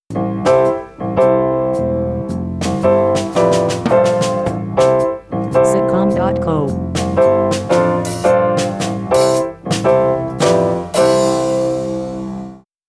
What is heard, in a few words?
Blues